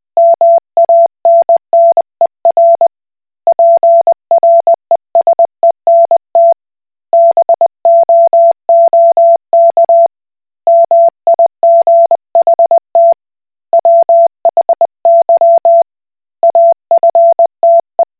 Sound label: Koch Morse